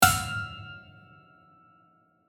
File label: Haunted piano string hit horror